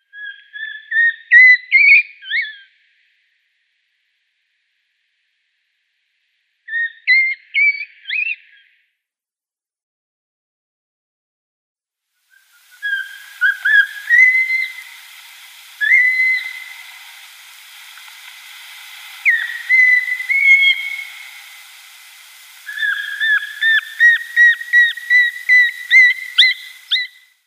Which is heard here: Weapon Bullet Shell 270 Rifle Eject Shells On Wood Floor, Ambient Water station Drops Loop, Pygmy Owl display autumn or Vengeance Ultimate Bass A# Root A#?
Pygmy Owl display autumn